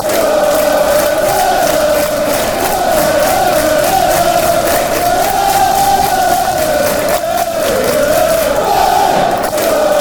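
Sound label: fanchants, football chants; bury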